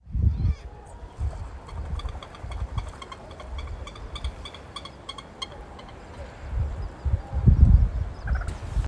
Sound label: purple gallinule, birding by ear, Bonaire